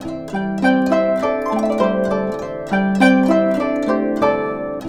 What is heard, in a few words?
GUCHIN 90 C; INIS - Opium; GUCHIN 100-C